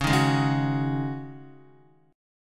C sharp Suspended 2nd Suspended 4th, C sharp, Guitar; C#sus2sus4 strummed